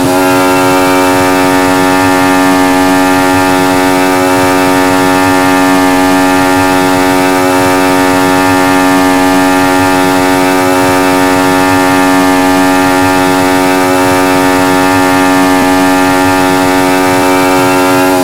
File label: V6T on high; AOS F1 2022